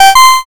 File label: notify